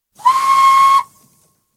Steam Launch, single blast on hooter (31ft, 19th century); Boat; Steam Launch 'Victoria'; BBC